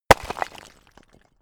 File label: stone hit shatter; natur-tiere; steine